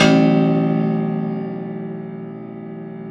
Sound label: Rubbery Piano, prepared piano; Prepared Pianos; Total Piano; Rubbery Piano 2 +ped